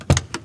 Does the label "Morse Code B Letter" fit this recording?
No